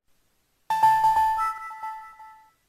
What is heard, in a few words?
nintendo dsi startup